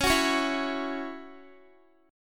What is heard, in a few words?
Db Minor Double Flat 5th Db Guitar; strummed; Dbmbb5; JGuitar